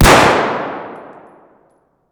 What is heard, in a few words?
toz fire indoor distant; Escape from Tarkov